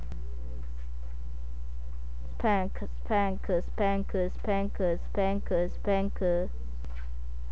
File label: spank